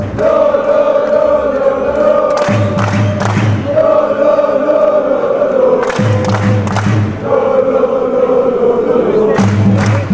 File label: lololo forza, fan chants; fck